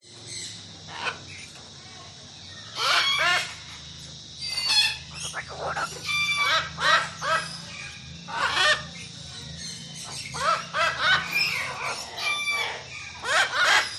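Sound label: animals birds tropical aviary ambience parrots parakeets voices mower background; speaking; tropical avery; zapsplat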